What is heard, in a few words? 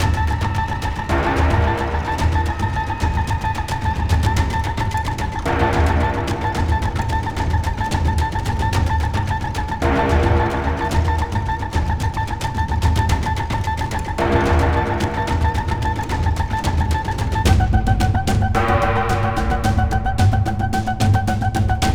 Hive Intense, gamerip